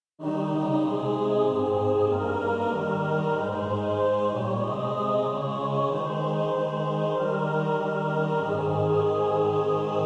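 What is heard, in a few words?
mixed, practice music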